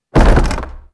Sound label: door shake